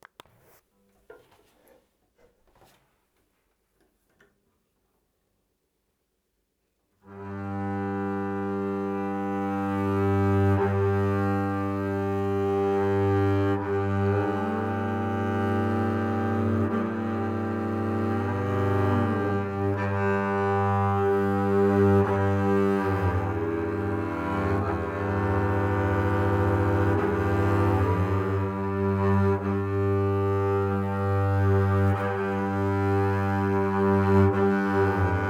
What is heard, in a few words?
Double bass sound; Inga Margrete Aas